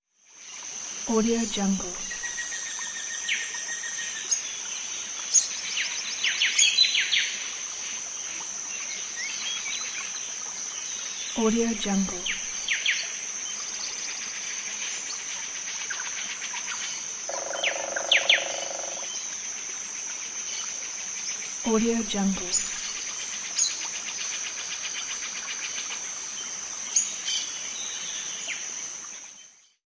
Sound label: jungle day